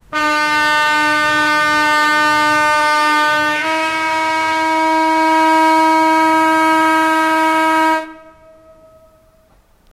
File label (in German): Presslufthorn; air horn